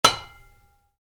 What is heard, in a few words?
Wood hit metal ring, Wood, Light Impact: Metal, Rings Out; Wood Sound Effects; Materials